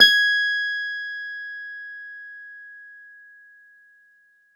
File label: Clavi, Digital Keys